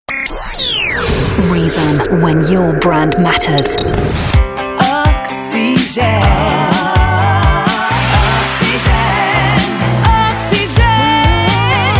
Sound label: Full Sung Jingles; Oxygene Automne 2K11; Reezom